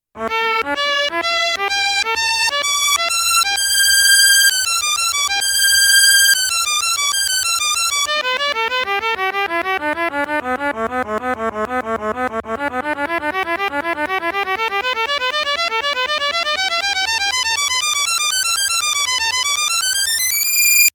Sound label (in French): violon concerto